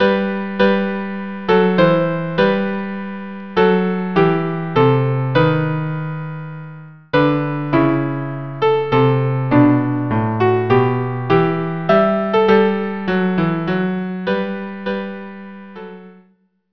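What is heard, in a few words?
violin cello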